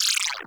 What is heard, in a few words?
Sentient Trooper Link Beam End